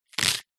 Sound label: leek slice knife on wooden chopping board